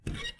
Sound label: metallic creak